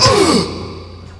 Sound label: grunt